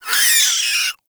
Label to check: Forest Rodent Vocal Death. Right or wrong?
Right